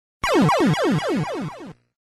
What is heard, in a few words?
death sound effect; megaman 3